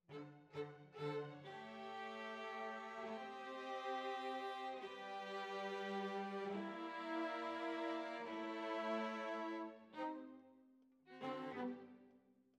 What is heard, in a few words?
Violas, jazz for piano